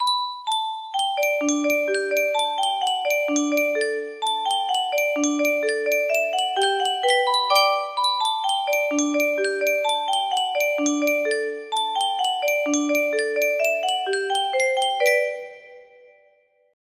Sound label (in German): yunsheng spieluhr